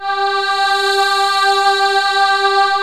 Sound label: SYNTH FX